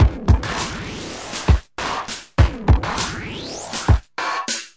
screwed up space groove, drum loops and breaks, 100 bpm; 091 to 100 bpm